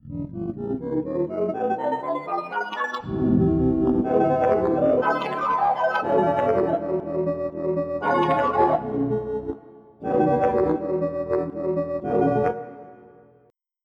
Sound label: Synthomatic; Onj's Soundfont